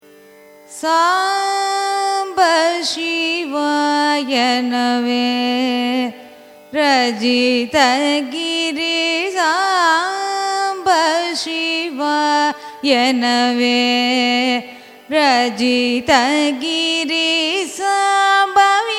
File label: raga khamas carnatic; carnatic music